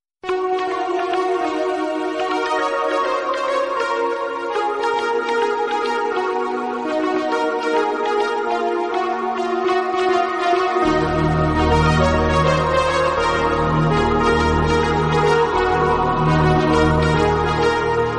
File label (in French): nouveau matin sonnerie